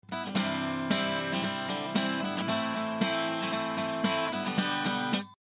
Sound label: acoustic guitar